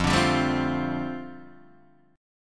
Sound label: F 6th Add 9th F down Guitar Open D, chord